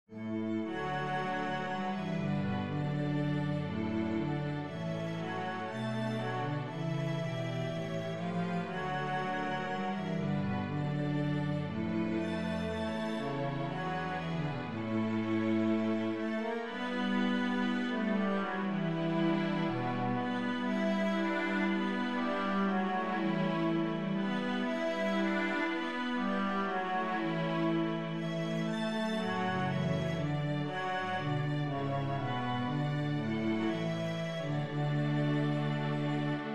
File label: concerto b minor, cello